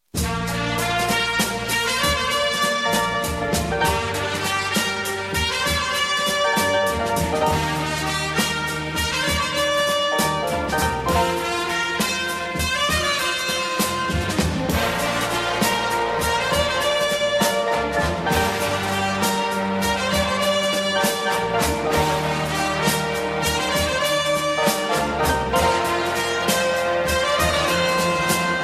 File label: Trumpet